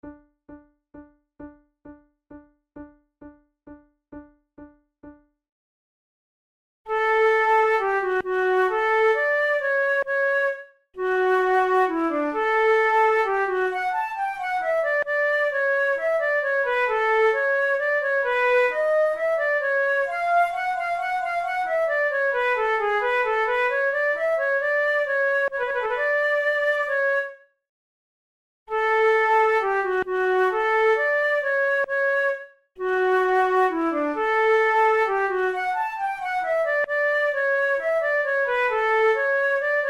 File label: duos faciles menuetto, flute tunes; devienne